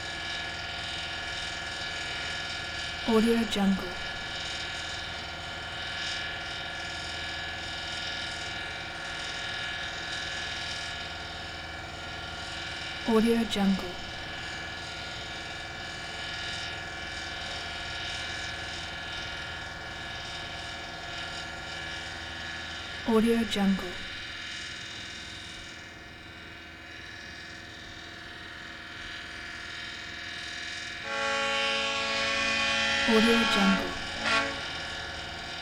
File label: wharf area; prosoundfx